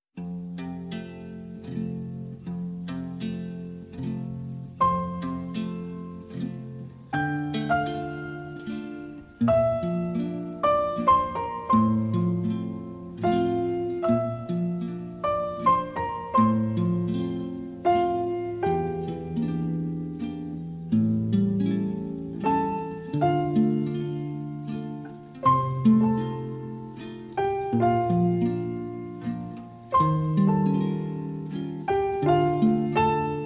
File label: Piano And Guitar